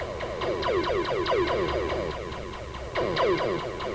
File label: Game BGM; EightBallDeluxe DEMO